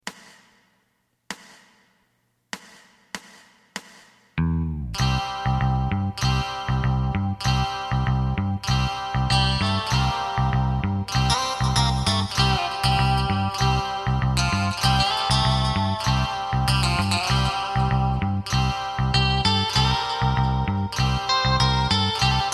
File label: drum